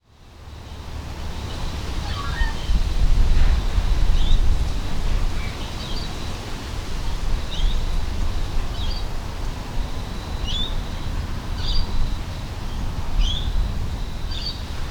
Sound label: ambient sound; modbury